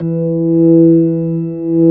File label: ORG B3, ORGANS; Sound & Vision; Gigapack I CD 2